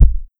Kick House

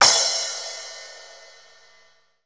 Hi Hat Open

